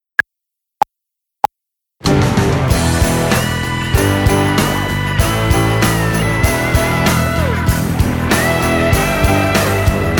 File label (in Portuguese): Rockin Rests Sem Flauta Guia; Nivel 2; 2.o Ano de Escolaridade; Obra Obrigatoria; 11o concurso de flauta de bisel municipio de redondo